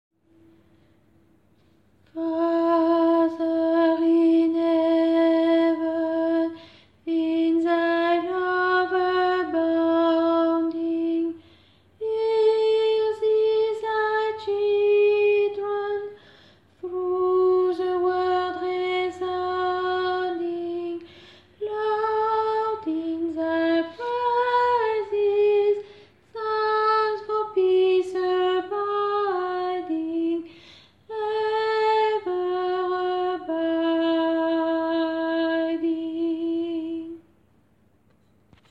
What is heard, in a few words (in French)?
soprane 1 voix